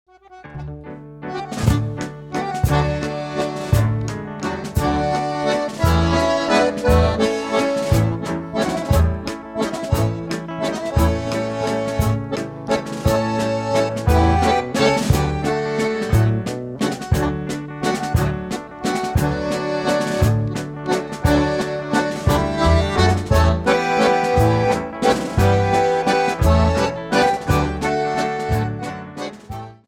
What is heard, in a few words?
Waltz